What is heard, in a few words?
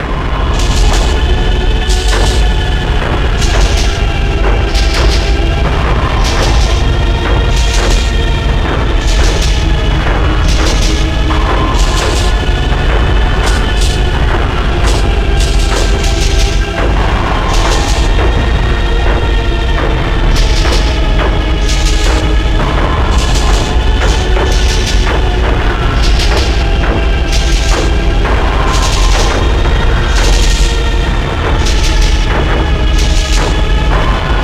Panic